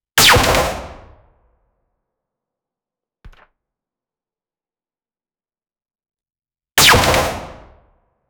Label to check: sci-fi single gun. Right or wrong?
Right